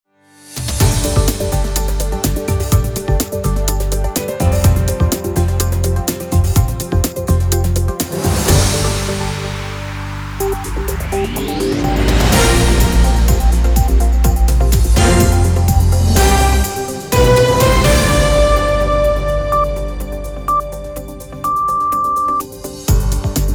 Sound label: Teaser News; Spreeradio